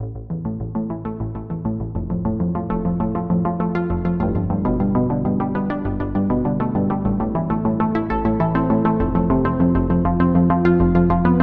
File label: Celtic Synth; KORG OASYS; oasys assault